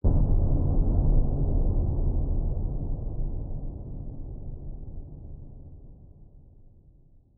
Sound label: Drumhit; Frozen Flame; windows